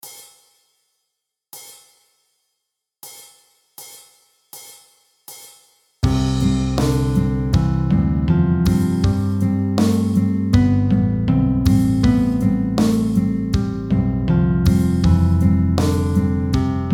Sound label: c major scale